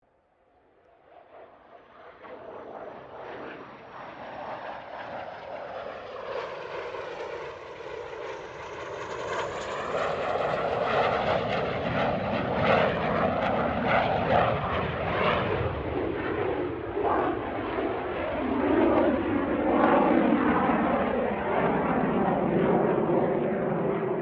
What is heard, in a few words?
AIRCRAFT CA-27 SABRE PASS STEREO; CA-27/F-86 Sabre; Airplanes; Vehicles; Aircraft Collection